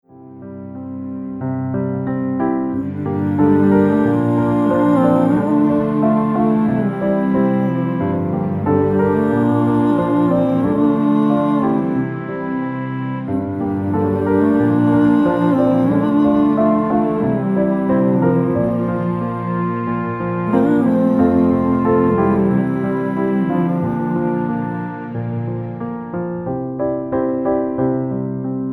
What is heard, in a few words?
Gold Instrumental